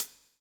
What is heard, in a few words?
COPS STK; Spectrasonics - Backbeat